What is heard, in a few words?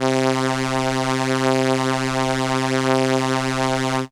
Violin C3, ARP Solina, synth; musicradar-synth-samples